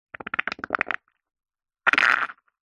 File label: cartoon squelch wet poop fart runny bubbles; zapsplat